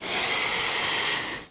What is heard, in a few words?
Lizard, Water Monitor Hiss. Close Perspective.